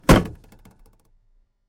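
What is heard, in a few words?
suitcase thrown into the trunk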